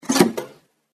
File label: pull, wheel